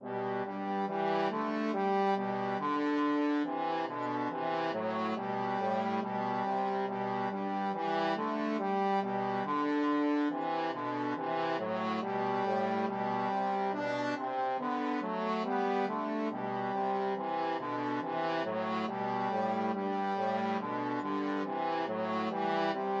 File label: trombone duet